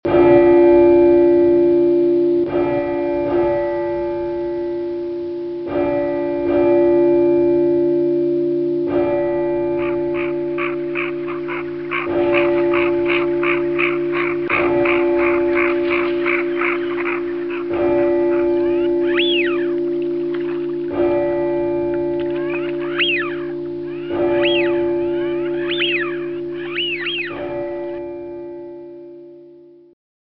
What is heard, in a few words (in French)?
bourdon siffleur, animaux